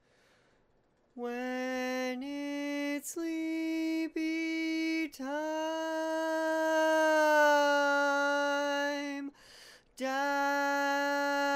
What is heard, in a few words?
Tenor, barbershop